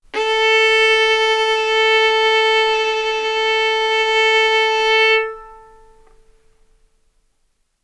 Violin arco A4 B4; mf; sul A; stereo

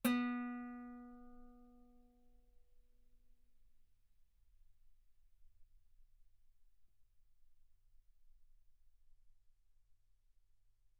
Guitar B3; ff; sul B

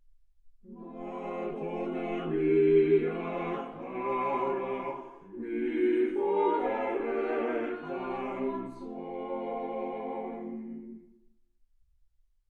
quartet

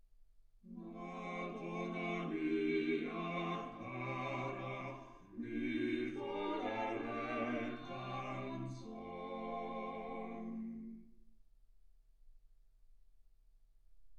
quartet

